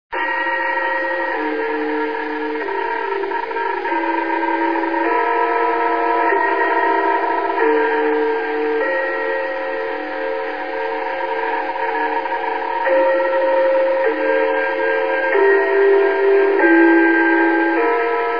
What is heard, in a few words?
gongs